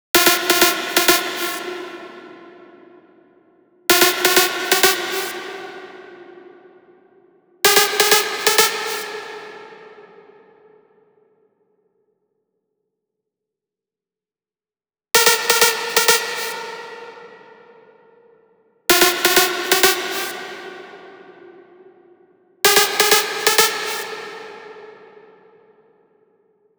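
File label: 128BPM Drop Lead wet SC, Synth, F minor; Vengeance Essential Clubsounds Vol.5; VEC5 Demo Constr Kits; Mainstage Kit; Root Fmin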